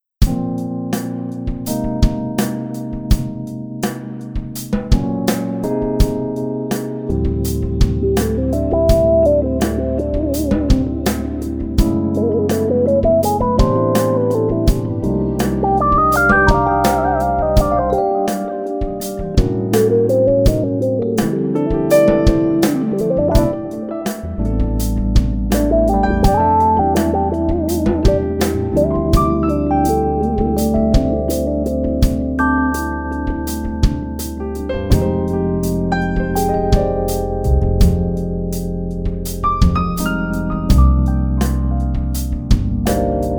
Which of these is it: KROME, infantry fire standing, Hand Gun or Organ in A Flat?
KROME